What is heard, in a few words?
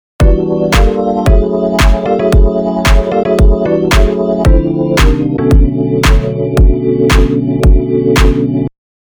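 with Drums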